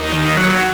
melee frying pan; tron mod; weapons

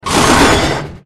hit; slender; fleshpound

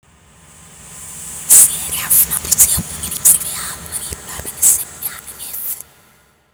reversed; slender

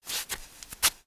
slide; slender; zombiebm

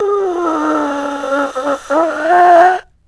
animatron breath; slender; fivenights common

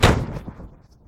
cannon; armoredcar

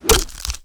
claw strike; slender; zombiebm

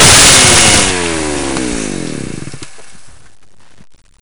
saw kill; slender; cof

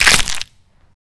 new neck snap; slender

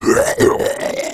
die; slender; zombiebm

